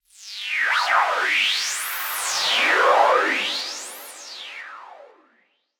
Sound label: sound design digital synth sweep filter sci fi